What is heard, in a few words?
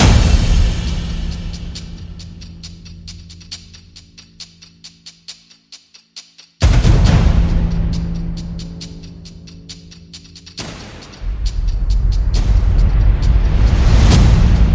Percussion and FX